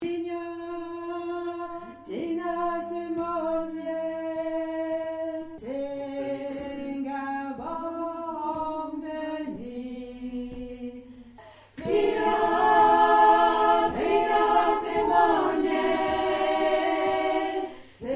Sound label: alto